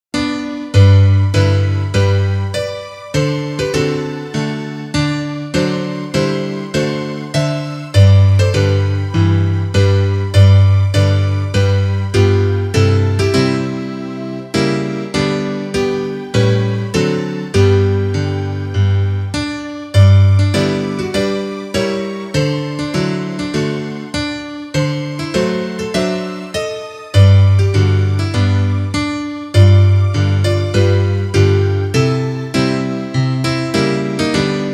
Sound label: instrumental; porque morreu por mim